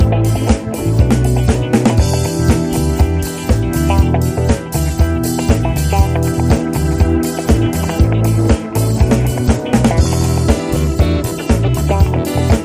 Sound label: Disco Funk Guitar